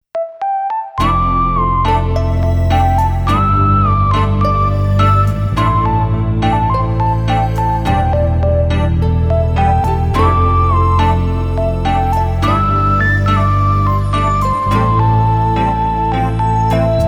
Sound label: Relaxed Walk; sense off originalsoundtrack perfect drama 2001